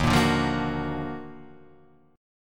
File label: D sharp Minor Major 9th D sharp down